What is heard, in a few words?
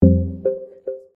click out